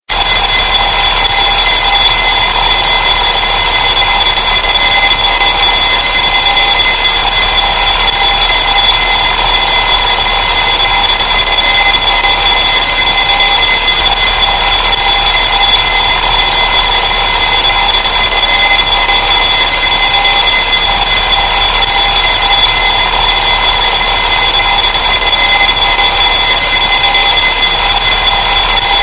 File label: old alarm clock; ringtones